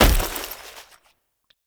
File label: Foley Weapon Fire; Warframe; Characters; Kahl